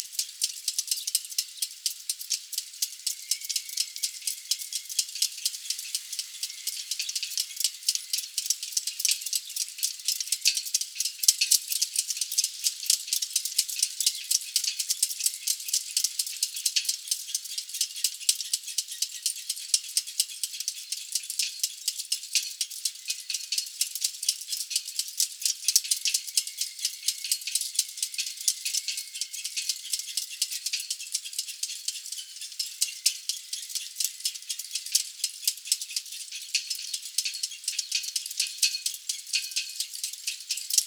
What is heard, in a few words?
Sailing